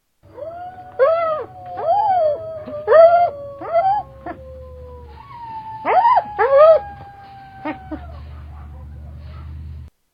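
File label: coyote voice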